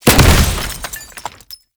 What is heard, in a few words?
Ammo Explosion; Warframe; Frumentarius; Ammo Ability